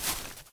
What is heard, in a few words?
moss step; blocks